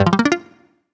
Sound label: Gm7 Lovely Strummin MUTED, Chords; Electronisounds